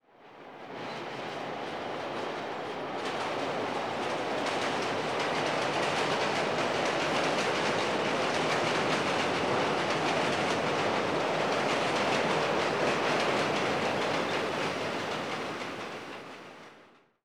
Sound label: Train Miss